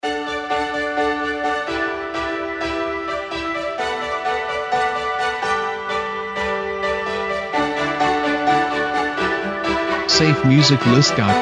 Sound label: Uplifting Strings